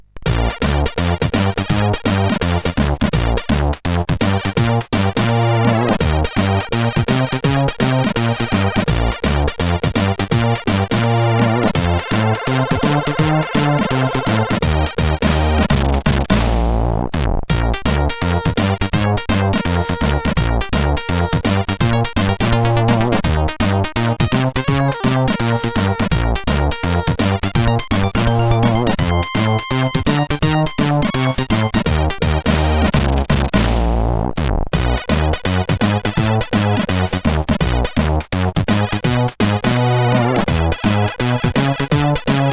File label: Intro, sid CSG8580R5; NATO